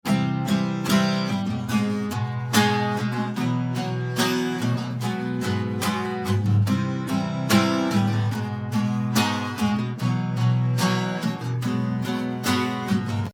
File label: acoustic guitar, stereo